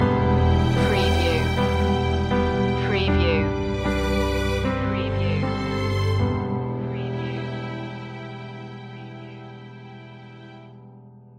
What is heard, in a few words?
car advert music loop ending